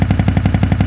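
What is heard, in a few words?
engine accelerate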